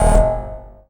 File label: minimoog tech eff